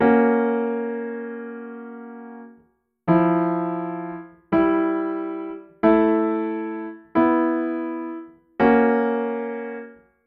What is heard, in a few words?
pianoteq d4